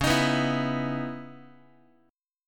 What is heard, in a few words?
C sharp Minor 9th C sharp Guitar; C#m9 strummed; x,9,6,9,8,x; JGuitar